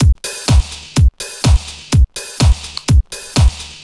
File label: Drum Loops, 125 BPM